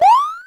Jump Mini; New Super Mario Bros. Wii

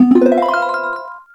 Enemy Battle Start

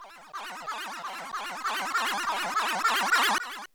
Appear; New Super Mario Bros. Wii

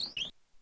Ice Skid; New Super Mario Bros. Wii

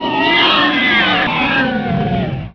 die, sound effects; mario 64; bowser

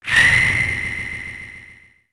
Power; Super Smash Bros

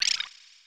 Grappling Hook; Samus; Super Smash Bros

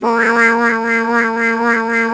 spitting juice; Yoshi; Super Mario Sunshine

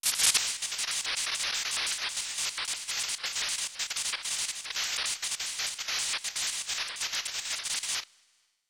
Electrical Flow; Super Smash Bros; Pikachu

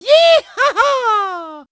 jumpspin "yeehaha"; Mario; Super Mario Sunshine

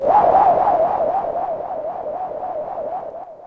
Cloud Enemy Exhale

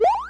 Yoshi Jump Off; Wii

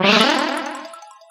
Magic Wand

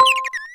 Para Beetle

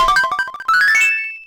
Toad House Item Get; Wii

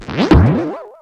Koopaling Enter Castle; New Super Mario Bros. Wii

